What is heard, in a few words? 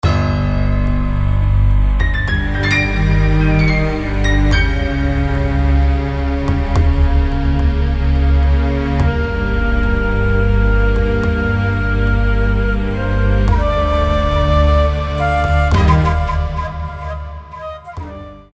Flute and Strings